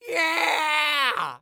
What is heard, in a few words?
Human Male Yells And Cheers Yeah